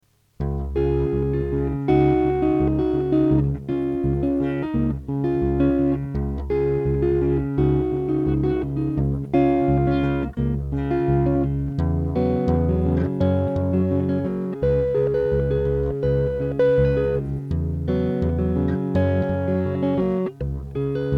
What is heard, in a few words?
rock